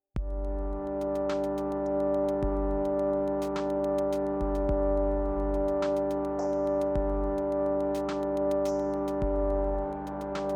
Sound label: pad slight mod